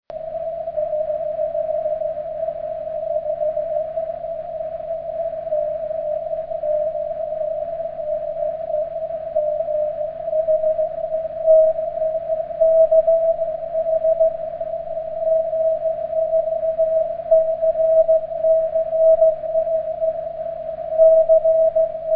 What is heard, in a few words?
CW, 23cm